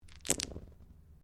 Dice Mixed Carpet Throw; Variety of dice sounds; Casinos

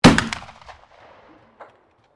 RIFLE 7.62 HUNTING FIRING

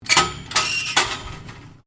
GATE SUPERMARKET AISLE GATE OPEN STEREO; Gates (Sound Effects:Doors)

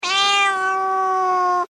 Animal Cat Tabby Meow; Domestic cats; Cats (Sound Effects:Animals)

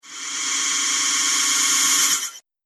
Some Laser Gas; Laser Sound; Sci-Fi Laser; Laser Sound Sound Effects

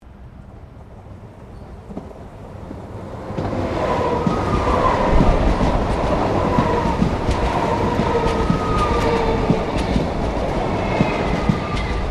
Train Melbourne Exterior Passes By

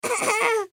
Human Cough; Clear Throat Male Toddler; Clearing Throat; Sound Effects:Human

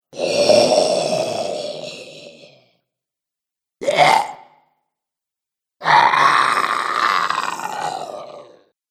Zombies Selection, Zomby Sound Effects; horror sound effects

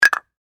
Foley Cap Set Down Plastic; Set Downs; Objects; SFX Bible 6

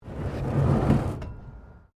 PLASTIC BARRICADE SLIDE; Material components for constructing more complex sounds

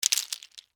Debris Mixed Nuts, Pile; Texture; Debris Sound Effects; Materials

